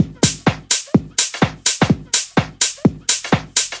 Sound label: drum loop house breaks; drum loops